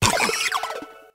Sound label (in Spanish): Grito hembra; Oinkologne